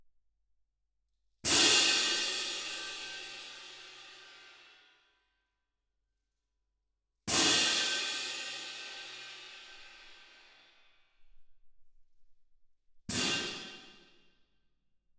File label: Twin R Perc